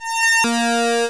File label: hivemind beam; Station-0